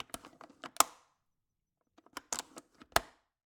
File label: Cabin, window, latch, flip, lock, open close, hyper; Eiravaein Works; Vaeyan IV